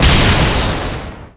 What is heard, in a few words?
Enemy Fashion 2nd Hp Damage Hit